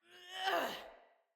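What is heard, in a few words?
Exertion Female; Battle Barks; Envoy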